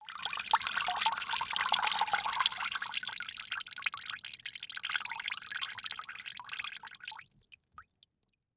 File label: Liquid Water Pouring Into Water In Plastic Bucket Light Stream Long Slow